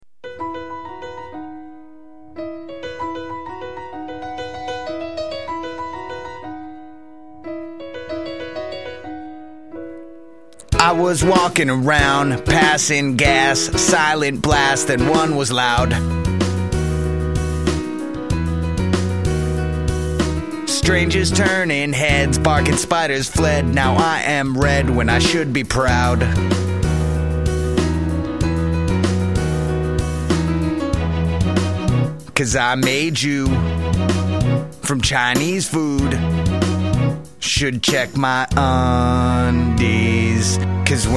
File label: farting song